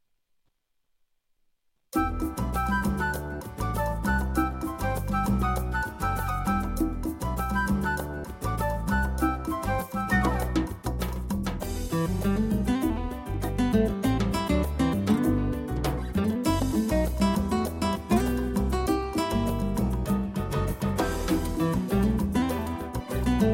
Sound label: tyros2 Bomba